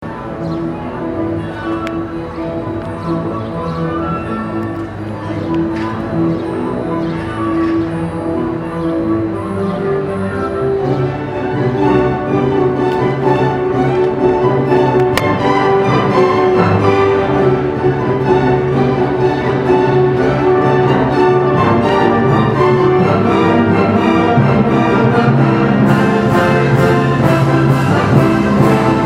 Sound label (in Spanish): filarmónica segmento musical